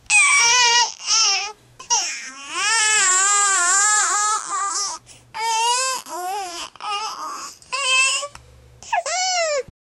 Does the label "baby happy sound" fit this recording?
Yes